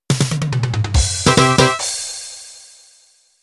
Quebradita Intro

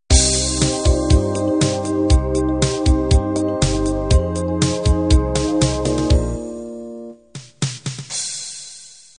8bt analog intro

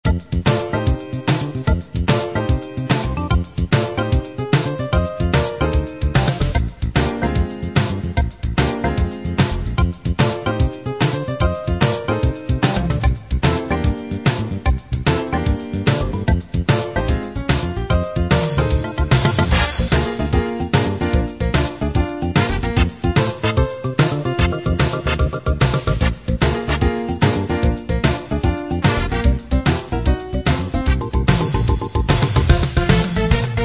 blues chicago

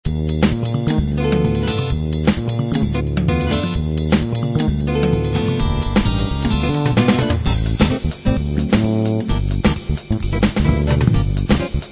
bop

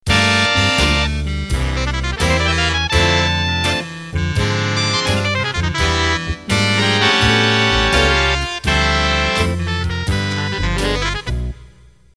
Big Band Slow Intro

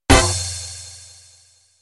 Batucada End

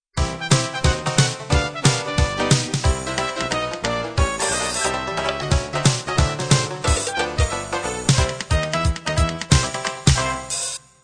dixie end

